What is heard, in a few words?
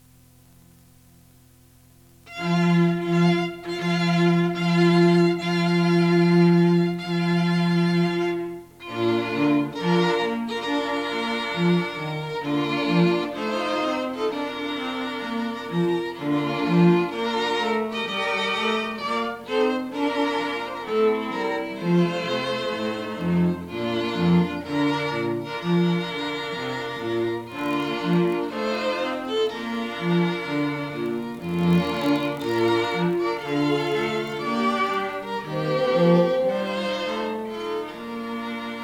Bridal Chorus